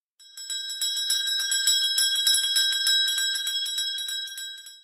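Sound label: sound of a little bell ding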